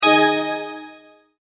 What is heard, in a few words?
multimedia tone correct